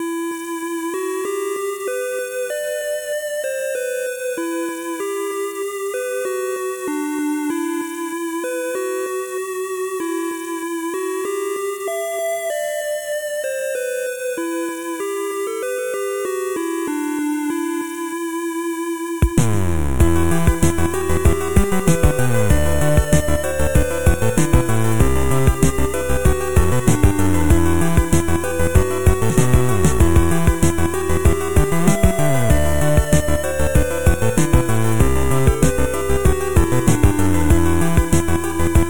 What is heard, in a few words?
chiptunes